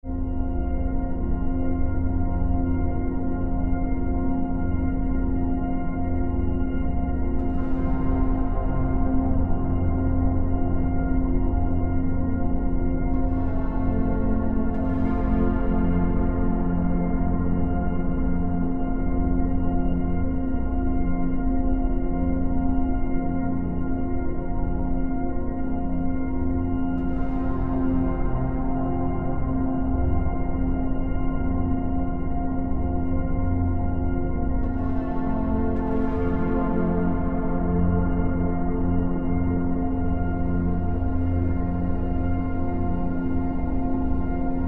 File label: Amb Sound Scape Mysterious chords; Outer Space - Floating; Ambient SoundScapes